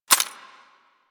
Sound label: Rifle Mech Secondary; Weapons; Mech Secondary B; Warframe; Tenno; Bard Rifle